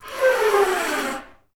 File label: FL FX, Advanced Orchestra